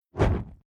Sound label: Whoosh Fireball Whip Impact Flame; Battle; Tom Hutchings